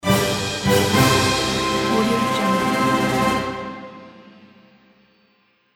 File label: happy win fanfare